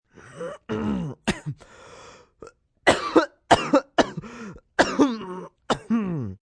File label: Cough Choking, Cough, male, clearing thoat (human voice); Sick Sound Effects; Sick (Sound Effects:Human); VoiceBox; Twistedtracks